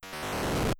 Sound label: Enemy Defeated; mother1